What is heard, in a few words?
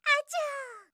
achaa; hidan